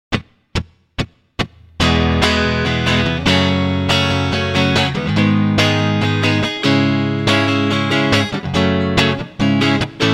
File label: Lead Playing Sample Chord Progression, electric guitar; electric guitar performance diploma